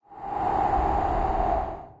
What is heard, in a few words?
wind; smite; kukulkan